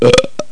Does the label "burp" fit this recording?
Yes